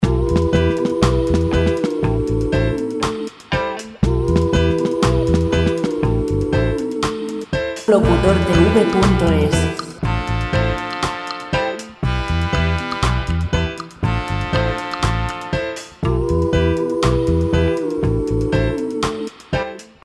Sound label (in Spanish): musica libre 120 pop vocal; Música vocal sin copyright; Música vocal sin copyright para anuncios